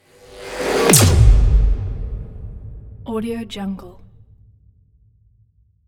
whoosh blaster transition; Sinematic 2; soundmorph

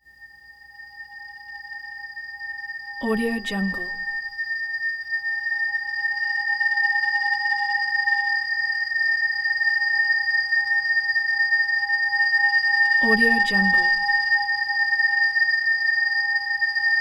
weird ambience constant ringing tone keening

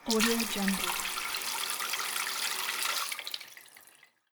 tap water

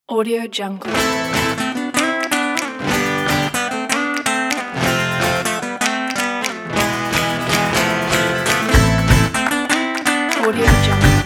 spanish

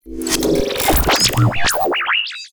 mechanism move bot small; soundmorph

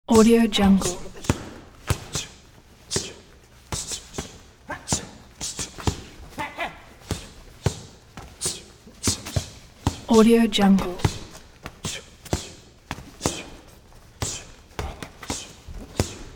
boxers sparring in ring; sound ideas

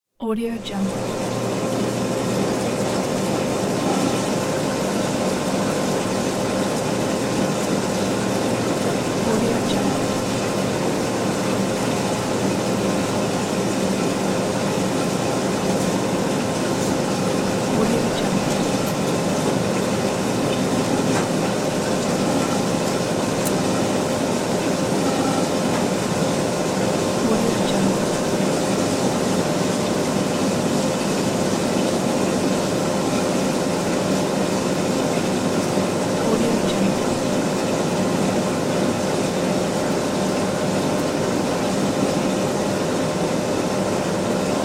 laundromat ambience

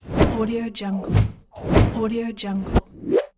whooshes

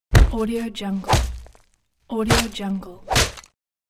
boxing

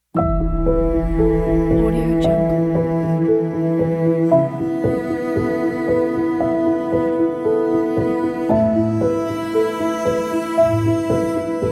calm wedding morning; melodrama-studio

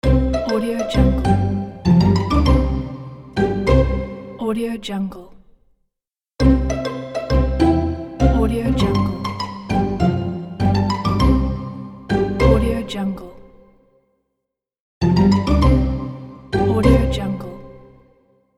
cartoon kids logo; petruality

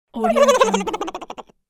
cartoon turkey lost

